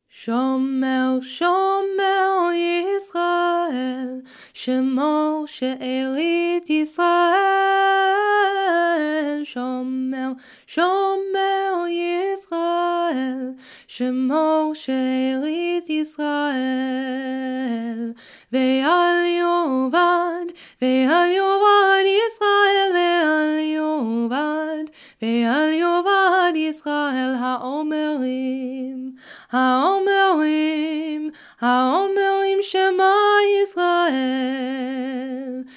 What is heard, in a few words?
Tachanun Shomer Yisrael tune; weekday minchah